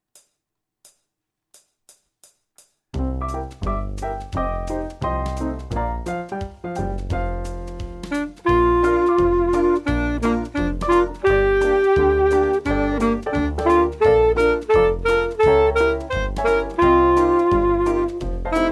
sax

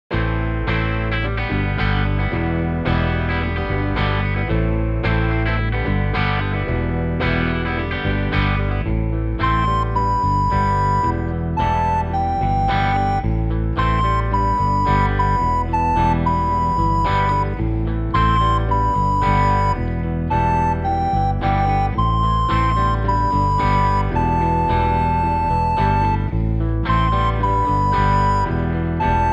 grunge flut; histoire rock

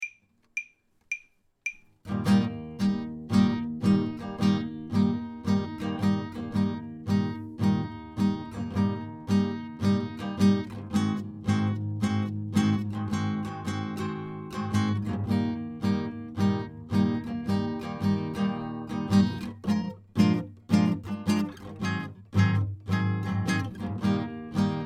up and down guitare

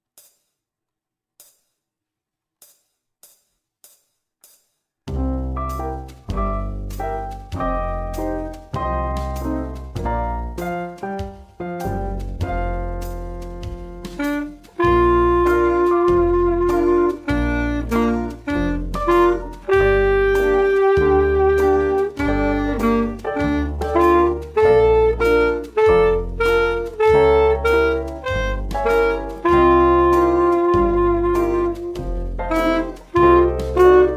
dolly sax

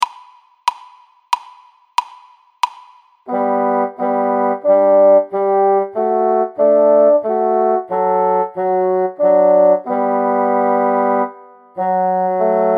auftakt duo, basson

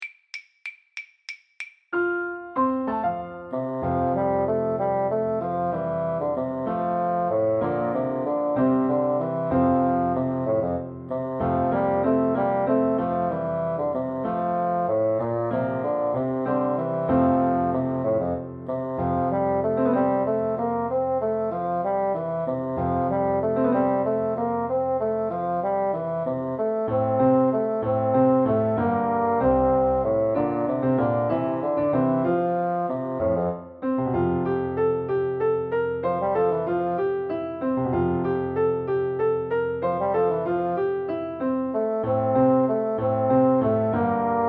the maiden blush piano basson

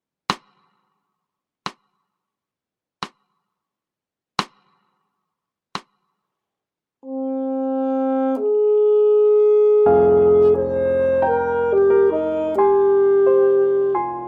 Dorien theme sax

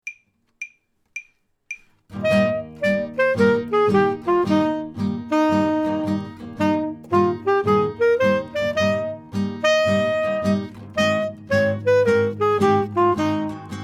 up and down sax

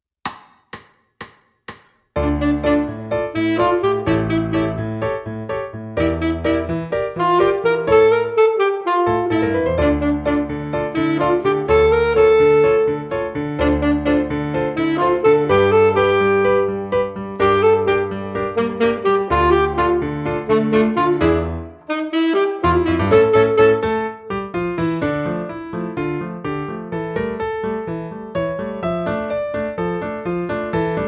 multi sax; multicolores

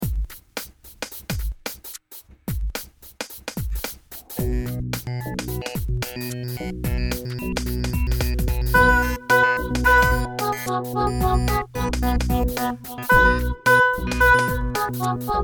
PAD solo

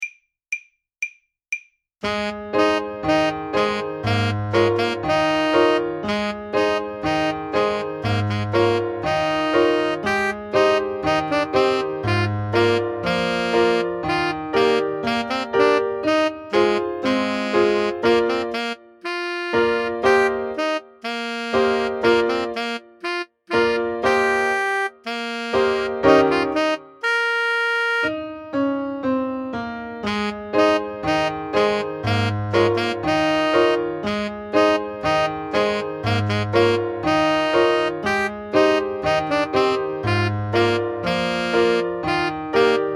danse clown sax

